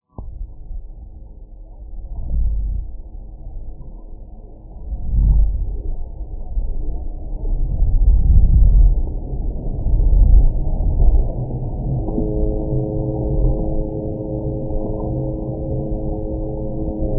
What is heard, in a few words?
toadfish